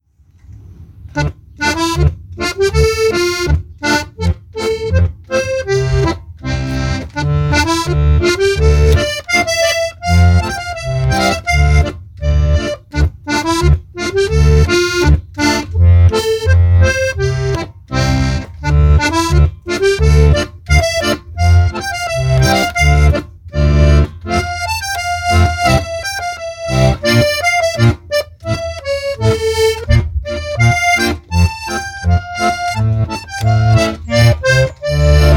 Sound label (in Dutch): solo harmonica; Kriebels